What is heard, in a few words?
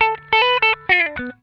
Funk Guitar; Zero G - Funk Guitar